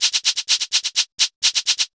127 DRM, Fresh Disco House

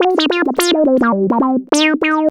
lead (brass)

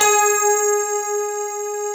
GRAND PF G 3, AKAI S-Series

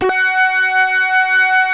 PPG F#4, Synthesizer; Hollywood Edge; Giorgio Moroder Rare Synthesizer Collection; PPG.2.3

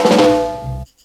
FILL DRUM; USB Soundscan vol.27; FILLACOUS

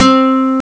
gitar streng; ST-XX Amiga Soundtracker Sample Packs

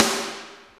SNR, Tekno Industrial; East West

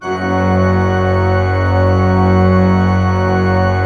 F1, Cathedral Organ; ILIO; Propeller Islands Cathedral Organ

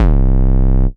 C Bass, DnB One Shots

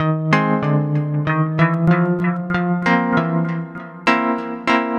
Acid Mod Pno-F, lounge, 096; Zero-G Kocktail Kollection; Construction Kitz

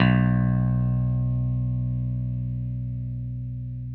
6STR BASS; Sampleheads; Whole Lotta Country 1 & 2

